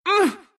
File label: pain, ru; dota2